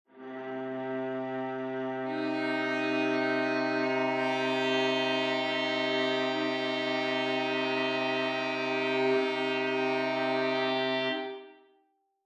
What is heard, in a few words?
chord minor; hermode